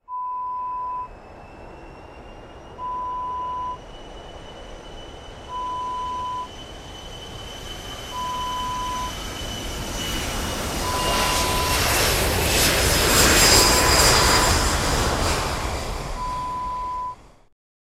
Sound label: Jet take off sound external